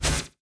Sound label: teleport